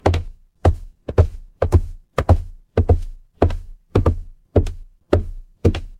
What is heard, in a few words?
footsteps stairs